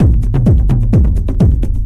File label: TRIBAL, Techno Trance; E-MU Formula 4000 Series Vol. 2